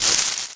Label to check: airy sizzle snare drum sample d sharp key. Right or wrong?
Wrong